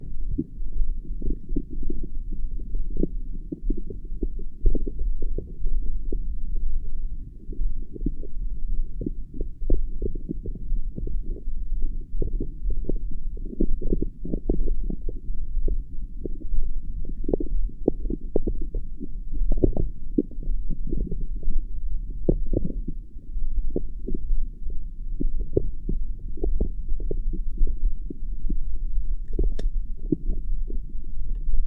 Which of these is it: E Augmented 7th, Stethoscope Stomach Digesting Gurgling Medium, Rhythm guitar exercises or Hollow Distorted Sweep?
Stethoscope Stomach Digesting Gurgling Medium